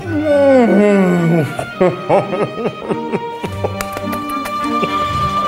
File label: ahhhh slurp; kollektivet